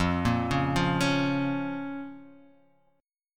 F Suspended 4th Sharp 5th F down; arpeggiated; JGuitar

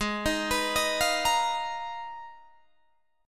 Ab Minor 6th Add 9th, Guitar, DADGAD; down

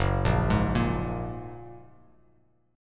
Gb Major Add 9th Gb Bass Standard, chord; arpeggiated; JGuitar

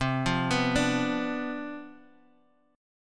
C Major 7th Suspended 2nd Suspended 4th, chord; arpeggiated; CM7sus2sus4; 8,8,9,7,x,x

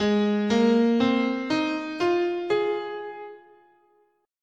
Major Pentatonic, scale, guitar; Gsharp

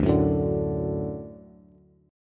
D Major D down Bass; D strummed; JGuitar

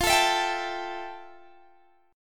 F Major 9th F, Guitar, Open G

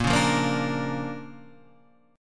D Minor Major 7th Sharp 5th A sharp, guitar

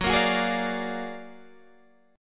Gb Augmented Major 9th Guitar Standard; Gb+M9 strummed; JGuitar

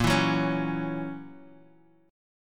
F sharp 7th, guitar

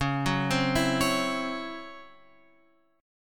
C sharp Minor Major 7th Double Flat 5th; C#mM7bb5; {9 9 10 9 X 9} arpeggiated

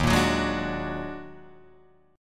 Eb Minor Major 11th, Guitar, Drop D

